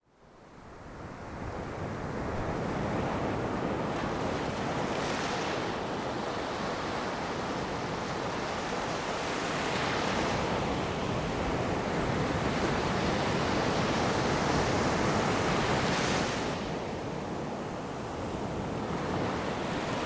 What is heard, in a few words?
ocean